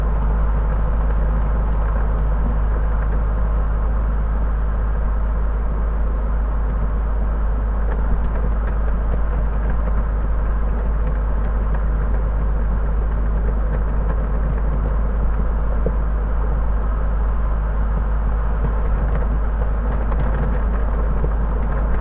Scion xB Onb Long Highway Drive 40 MPH Steady Driving Cabin; Poetry Project